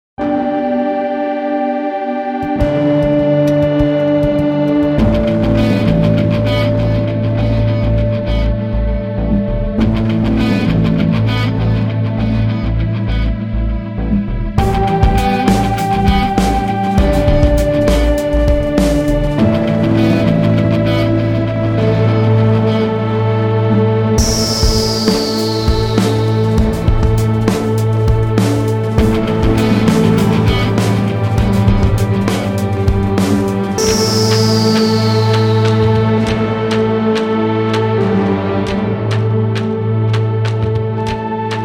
ultra Fanfare; Light2Art

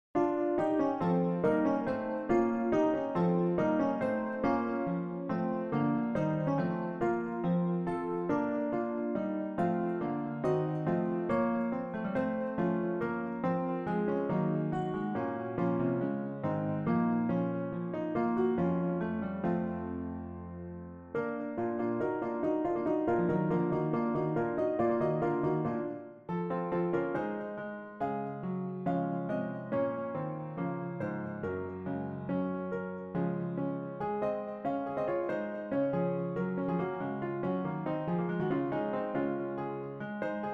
electronic Elevators

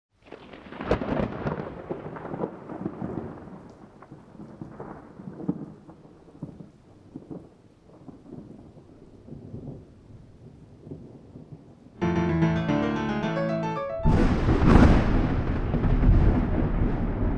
Thunderstorm Music; Hungarian

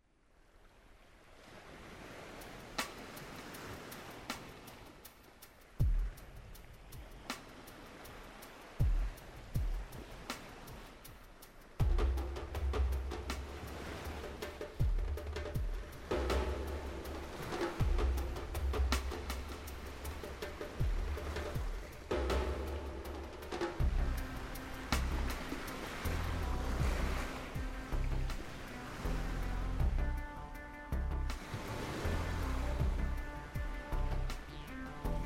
ambient ocean; Light2Art

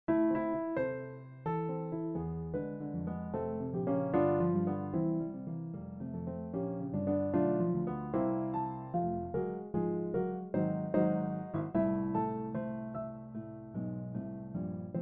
Short Piano

